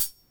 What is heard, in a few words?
ALEMBIC BASS; OMI - Universe of Sounds